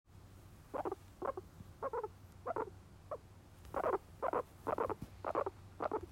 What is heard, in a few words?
purring, guinea pig; 0歳11ヶ月10日